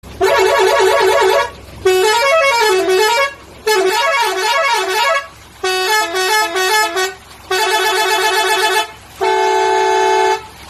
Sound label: Truck Pressure Horns